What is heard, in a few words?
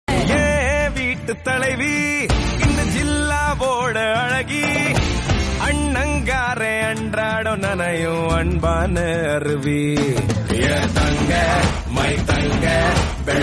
Tamil Sister Birthday Song